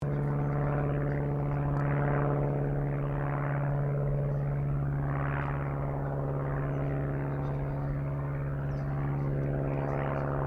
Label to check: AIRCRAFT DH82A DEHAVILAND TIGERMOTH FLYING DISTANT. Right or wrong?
Right